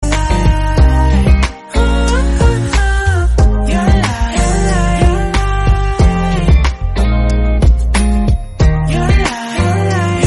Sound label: chinese song